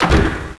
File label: door stop; XDM